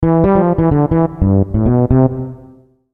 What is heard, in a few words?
Logo Cartoon Bass Line; Sonic Logo; Blastwave; Sonic Logos; Production Element Imaging Element Accent Transition